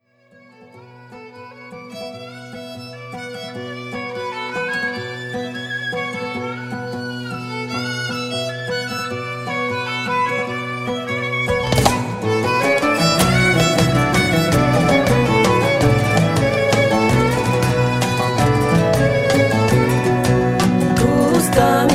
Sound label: gusta mi magla kosovo